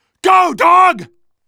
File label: dog cheers; hl2 ep2; outland 11; dogfight